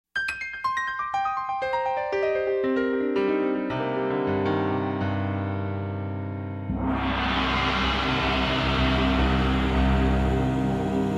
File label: atmosphere, eletronic suite